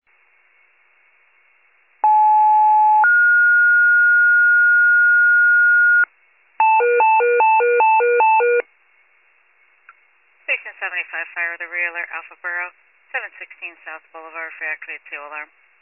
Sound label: FIRE pager alert